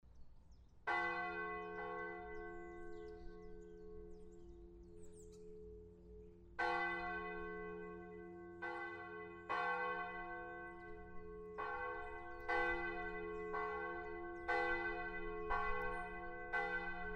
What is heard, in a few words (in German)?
Glocken; almersbach